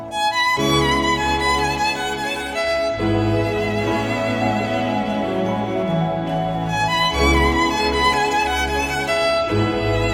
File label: Orchestra Instrumental Version; KHIII